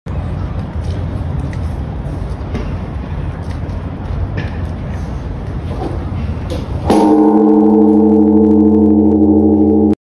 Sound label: big bell